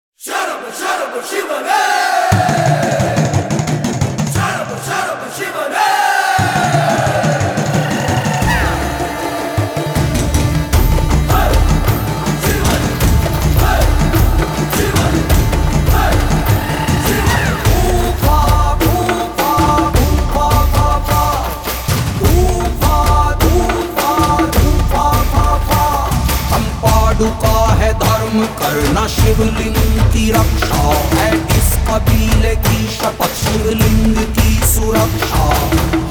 Kampadu Clan Entry Song - Hindi

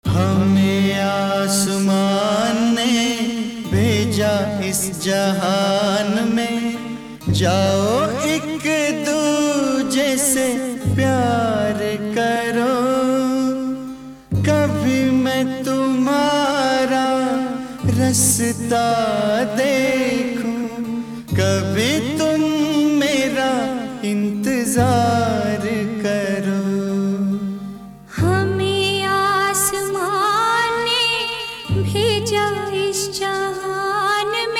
Sad Version

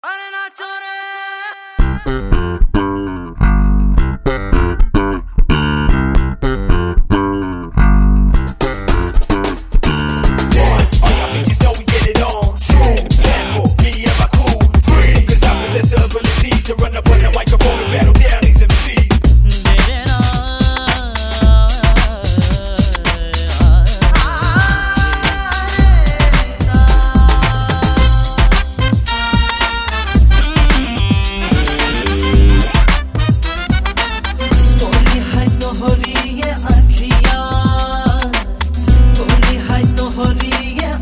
Kids Performance (Instrumental)

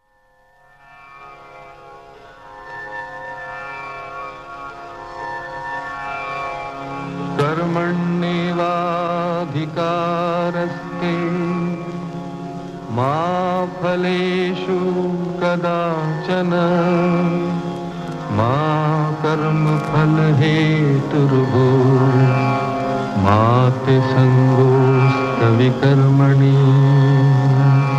Shloka

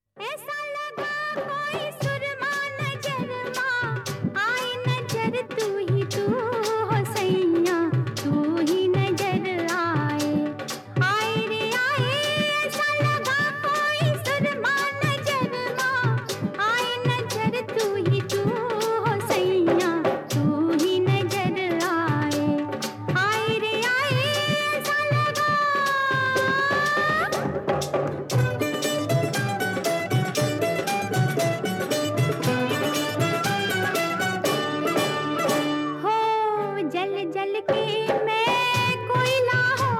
With Voice Over; Voice Over By Gulzar

